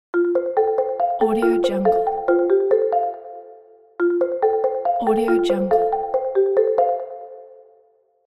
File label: marimba phone ringtone